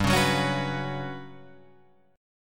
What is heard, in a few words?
G Major 7th Flat 5th G down Guitar Drop D